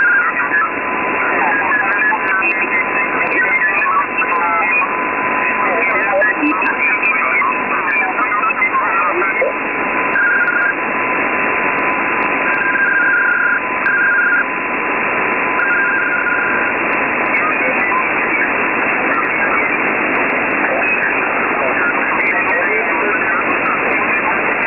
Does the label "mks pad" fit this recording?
No